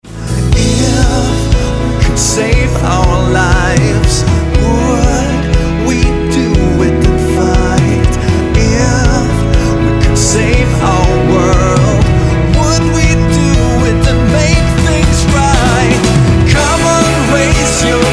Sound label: DRUM REPLACEMENT SAMPLE